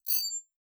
choose chip

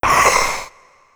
Soldier Twitch

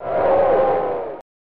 "Ohhh!"

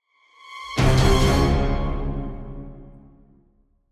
Sting; Ferno

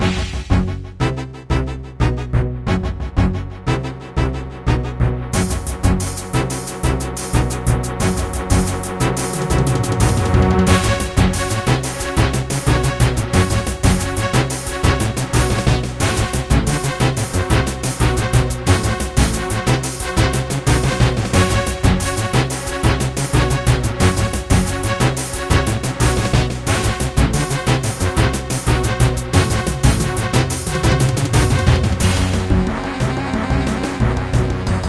fight

